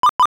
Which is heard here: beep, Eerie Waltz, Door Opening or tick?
beep